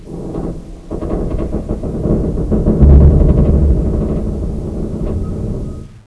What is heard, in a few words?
metal str large; hl1mp; minophor